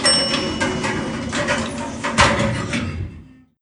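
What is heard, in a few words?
elevator bing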